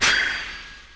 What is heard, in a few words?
MOVE; C64 Dreams; Multi-Sound Default